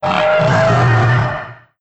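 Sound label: raptor hit; sf effects; sinfar haks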